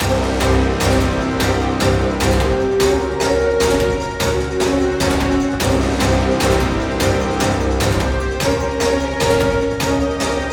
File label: boss coastal normal, 150 7 8